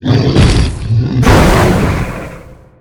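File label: boss spawn; monster; grogo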